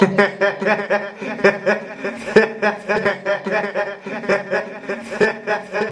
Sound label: hehehe